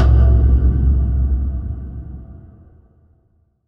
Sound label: Stage Light; Lewd SFX